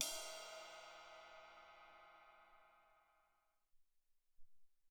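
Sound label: Ride Cymbal, drums; Alesis SR-18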